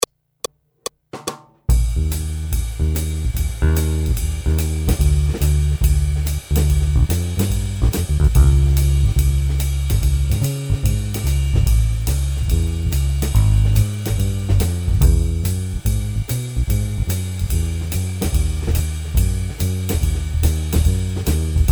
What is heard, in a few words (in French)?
Jazz Keys, Accompagnement seul